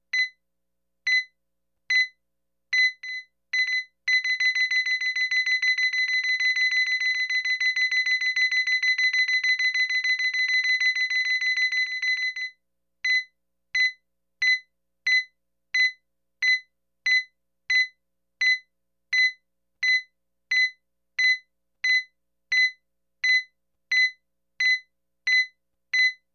EKG; Slow To Fast Beeps And Slow Again Hi And Thin Tone; EKG or ECG Electrocardiogram